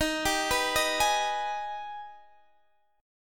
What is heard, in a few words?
Ab Minor Major 7th